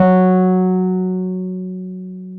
PNO, Wurly

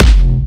Jump Kicks, Bassdrums

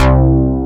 SYN, S CLAVI

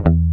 Mute E; Best Service Gigapack 2

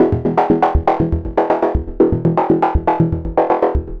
120 MOD GUIT E, Modular Synth Loops; Polyend; Simulacrum Sounds

